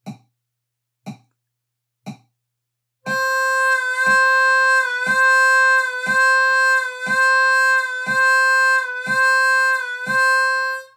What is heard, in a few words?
Vibrato 1Hz, harp